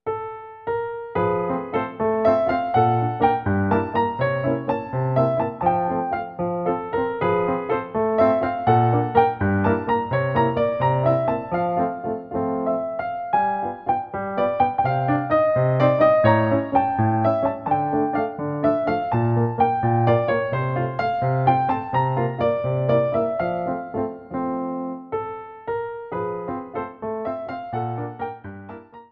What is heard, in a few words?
waltz